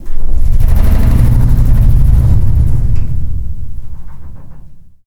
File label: metal creak